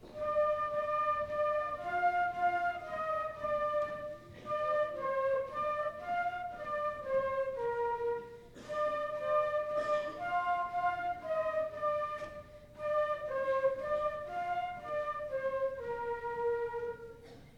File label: Flutes